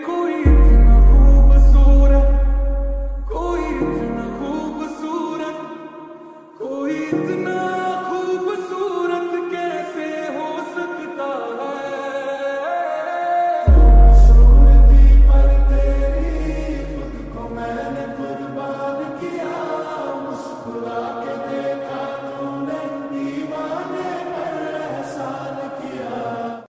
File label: Lofi Slowed Reverb